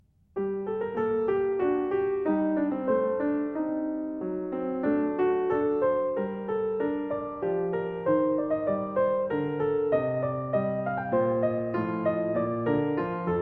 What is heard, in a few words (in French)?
menuet